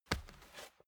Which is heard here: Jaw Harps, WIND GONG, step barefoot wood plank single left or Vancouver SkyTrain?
step barefoot wood plank single left